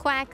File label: Quack